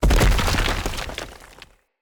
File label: Stag Gallop Charge Vegetation, Footsteps; Animals; Foley